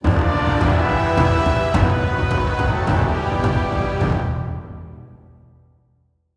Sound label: Stinger